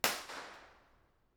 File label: church mouth church path, creswell crags; b-format